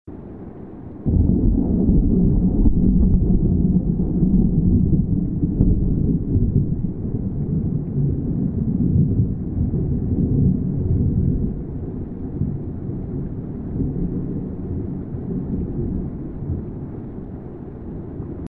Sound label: thunder low pass